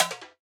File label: item use; Petrichor PSTVL